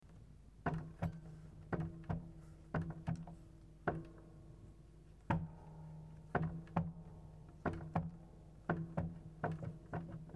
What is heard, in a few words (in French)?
deplacement, harpe